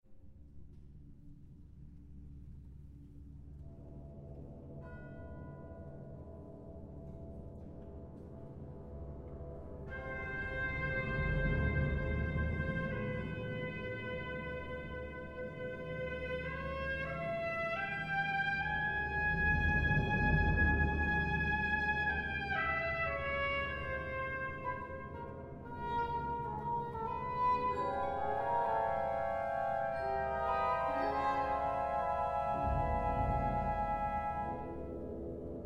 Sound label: PERCUSSION CONCERTO